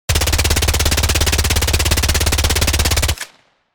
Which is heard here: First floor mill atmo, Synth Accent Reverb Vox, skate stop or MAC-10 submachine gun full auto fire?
MAC-10 submachine gun full auto fire